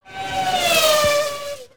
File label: Car Pass By Pitch Up